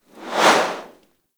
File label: Grenade Projectile By; Grineer